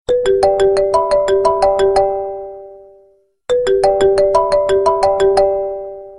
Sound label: short sms